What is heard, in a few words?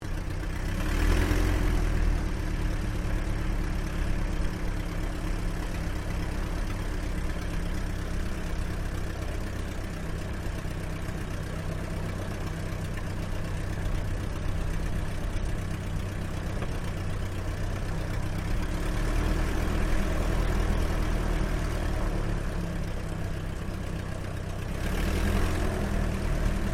AIRCRAFT DH82A DEHAVILAND TIGERMOTH TAXI GRASS INTERIOR; RAAF Tigermoth; Airplanes; Vehicles